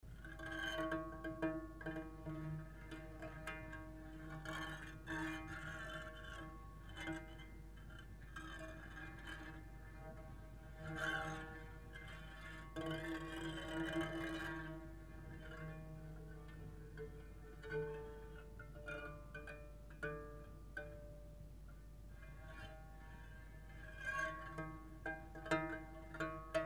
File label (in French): crin, harpe